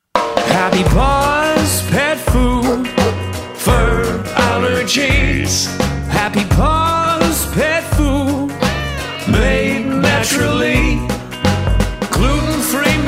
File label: Jingle; Happy Paws